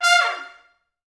F4, Pop Brass; Best Service; ProSamples vol.19; AKAI

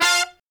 BRAZ LICK, BRASS LICKS

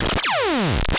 NL FX MIX; Masterbits; Vintage Voltage; SQ+FX

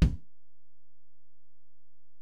Ac BD, Alesis DM-PRO; 300 Drum Machines

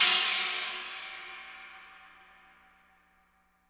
CHINA CYMBAL

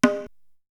Bongo; ZERO G - Datafile DISC 1

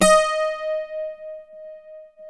PLK D#4 Bouzouki, Greek; NorthStar - Global Instruments VOL-1

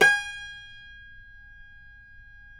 CUMBUS G#, Guitars; Spectrasonics; Hans Zimmer Guitars VOL-2

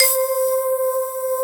SYN K-2000, Roland

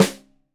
Rim Shot Stereo, drums; Deeply Sampled Drums; SMDrum

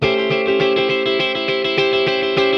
PICKY JANGLE, Funk Guitar; Zero G - Funk Guitar

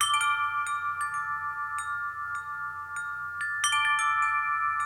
BELLS, COOL PERCS; Crypton - DrumBase5000

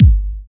DR. LOOP, DRUMS; X-Static Goldmine 3

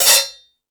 Dance 2000, Drum Machines E-MU Pro-Cussion

